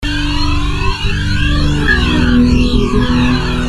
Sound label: slice loop